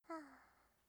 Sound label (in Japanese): 吐息; ループボイス